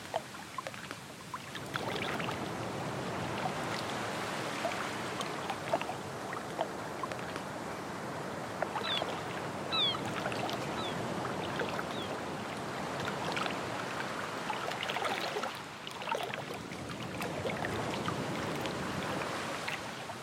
Ambience Harbor

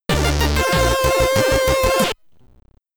Get Ready! (SID Stereo), commodore 64; Eskimo Games

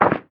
Ground short

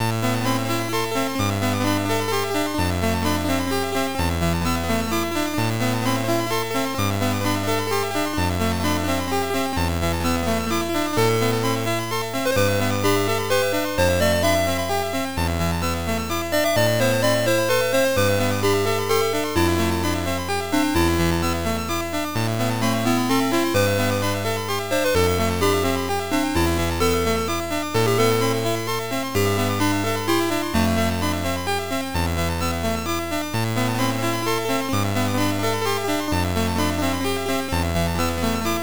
Elegant Melody; Riviera: Yakusoku no Chi Riviera; WonderSwan Color

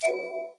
UI Close; gamerip; shuimufeifanjing

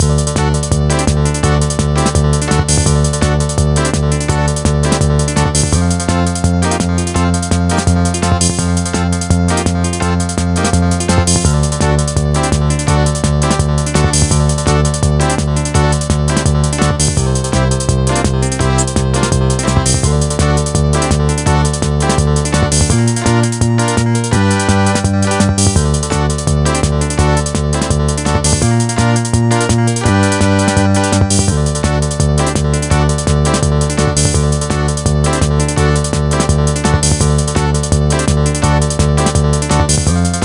Bonus Game Tune; the simpsons hit run; ps2

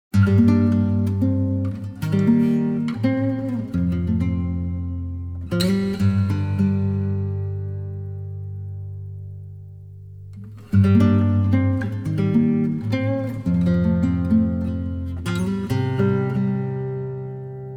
THE OATH (Guitar Version); Soul Calibur III; Legend of Sounds

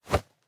Hiding Spot Exit Body; The Inn

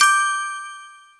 Got Gem; Marble Blast Gold

